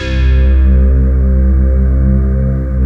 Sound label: arpichord C2